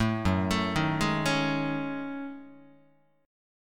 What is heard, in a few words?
Gb Minor 6th Add 9th Gb down Guitar; arpeggiated; JGuitar